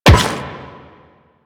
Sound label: Run Metal, Footsteps; Warframe; Quests; The New War; Kahl